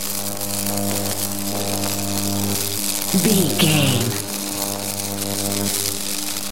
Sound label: electric sparks lightning loop